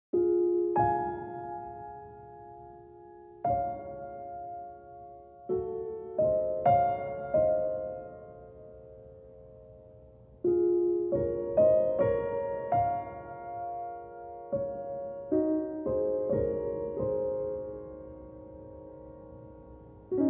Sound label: BGM Rain; toro and friends onsen town; toro to puzzle dokodemo issho; android